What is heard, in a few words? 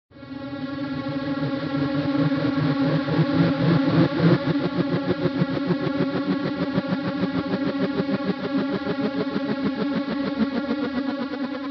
sound of space-filling curves; mitchison durbin; haverkort